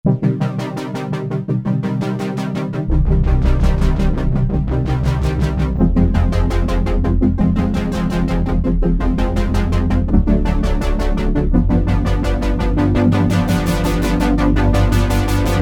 System700 Panner, Roland JD-990; Vintage Board

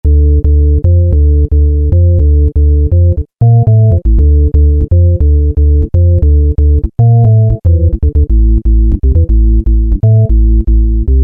Sine, Peavey DPM Spectrum Bass

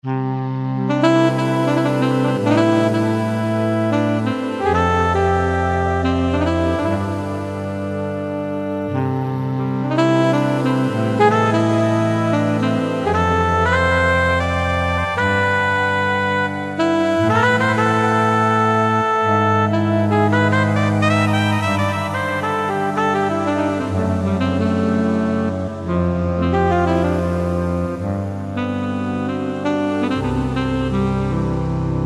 Dusty Sax, Korg T3

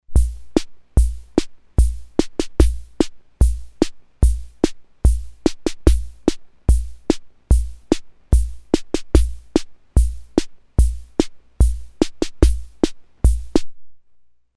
MARCH, Ace Tone Rhythm Ace FR-2L

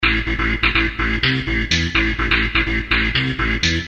Techno Bass, Dance; Sony NY Dance

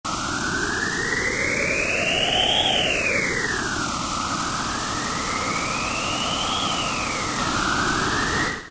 sfx Strobe; E-mu Orbit V2; Factory presets

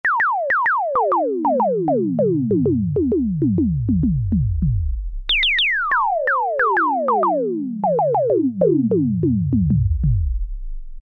Synthesizer Drum, Roland Juno-60; Factory presets

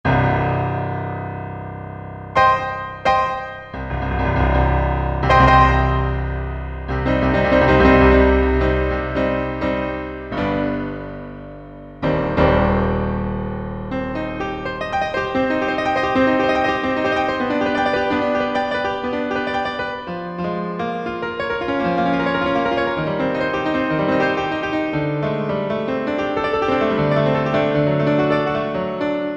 Clascl Piano, Roland SR-JV80-02 Orchestral

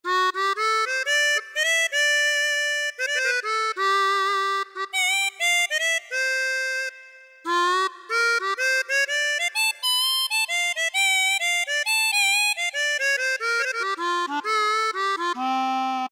Toots

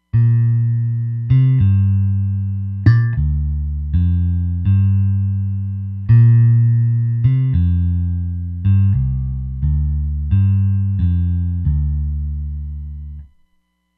Bass; Roland Fantom XR

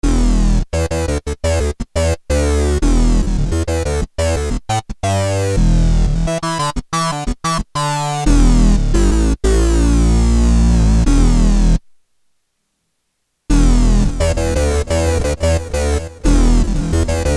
Studio Delay; t.c. electronics M300

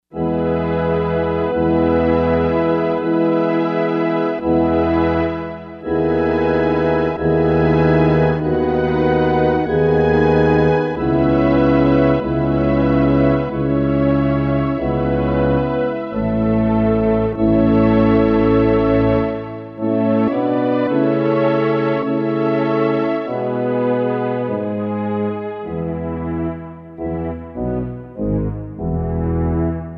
Orchestra, Korg MSC-4S; Korg MSC-4S Orchestra 1

